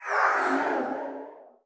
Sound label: Shade Startle